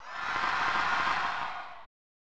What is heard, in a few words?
Applause; Wakataka Oozumou; SNES